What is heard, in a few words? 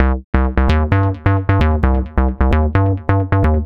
C 131, Synth Loops, Acidized; 131bpm; Zero-G; Soundclash Nu Dance Electro